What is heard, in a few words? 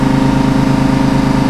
MOTOR, Slot Car